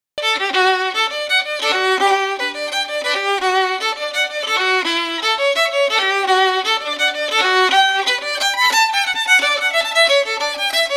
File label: hornpipe fiddle; derry hornpipe; tune tutor; SeamusGrantFestival